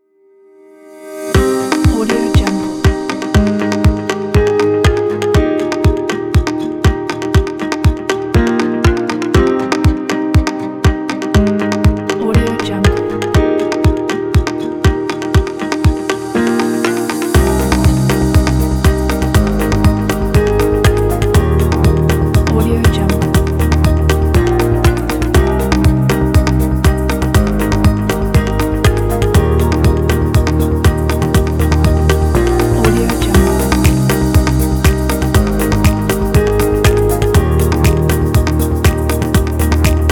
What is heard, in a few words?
corporate upbeat kit